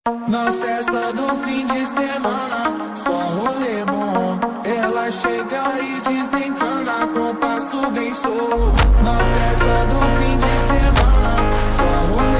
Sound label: Slowed Reverb Ringtone